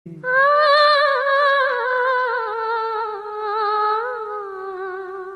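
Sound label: old malayalam message tune ringtone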